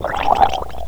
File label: DROWN